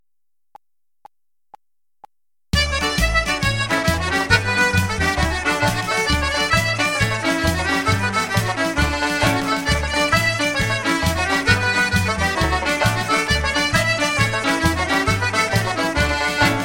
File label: Traditional Irish Jig D